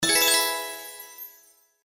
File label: Win Jackpot; slots; Chic Town